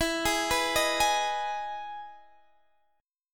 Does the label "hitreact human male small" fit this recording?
No